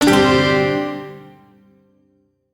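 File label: Shawzin Pentatonic Major Chord; Music; B; Warframe; Duviri; Chords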